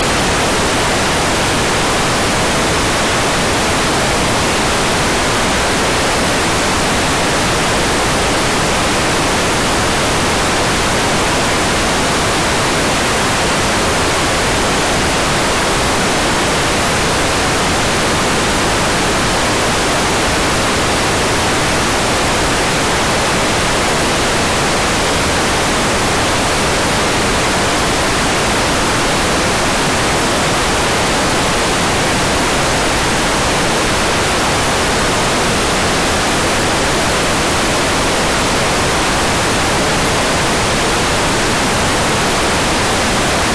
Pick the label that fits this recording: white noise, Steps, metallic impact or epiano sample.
white noise